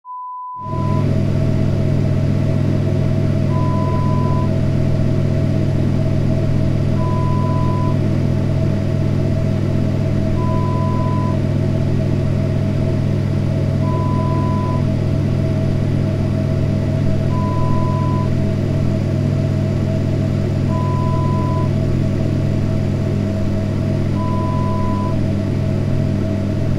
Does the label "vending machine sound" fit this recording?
Yes